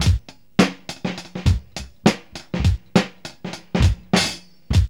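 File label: 102 bpm, Funky loops; Zero-G; Funky Elements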